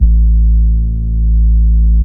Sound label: sub A, techno trance bass